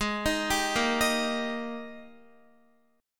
Ab Major 7th Suspended 2nd Ab Guitar 4E,4A,5D,5G,5C,6F; AbM7sus2; x,11,13,12,10,10; arpeggiated; chordshape; JGuitar